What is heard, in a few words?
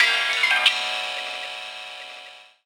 RAW JAZZ; Rawcutz; Nu Forms